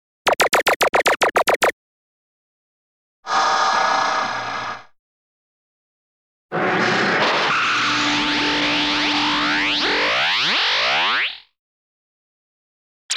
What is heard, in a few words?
Sci Fi Kiss Eye Beam, Disintegrator Ray, Atomic Laserbeam, Thin Squiggle Laser; Space Laser; Underground Caves